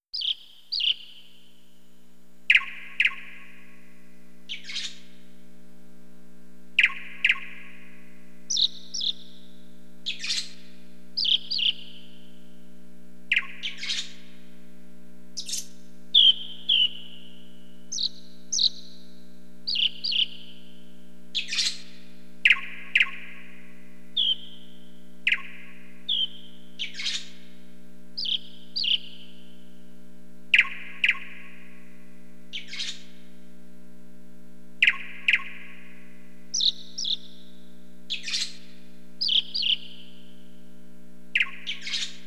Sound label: Wildlife Ambience; Pokémon Snap; N64; Wildlife Ambience 2